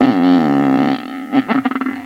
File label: fart medium